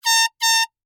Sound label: Air Horn Blow, Sports, Air, Horn, Blow, Twice, Handheld; Miscellaneous Sports; BlastWave